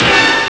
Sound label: horn blast; ST-XX Amiga Soundtracker Sample Packs